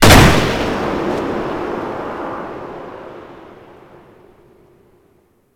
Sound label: Settlement Gun Fire; Warframe; Grineer Settlement